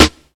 raw snare single hit d sharp key; snare drum samples

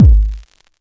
round bass drum g sharp key

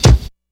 kick a sharp key; Mono; kick drum samples

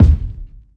short reverb bass drum sample D# key; kick drum

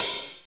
trebly open hat sound f sharp key

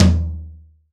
ambient tom drum sound d sharp key

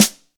natural acoustic snare g sharp key

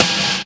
noisy snare drum sound g key; snare drum samples

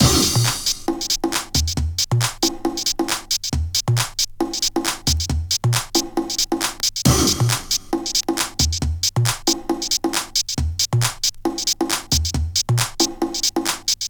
baby perc - a sharp m - 136, tribal voices; percussion loop

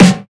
tight low end snare sound F sharp key

